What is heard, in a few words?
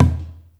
ETHNIC DRUMS; Spectrasonics; Liquid Grooves 1 & 2